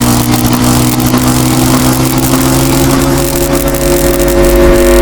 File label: tesla big charge; hunger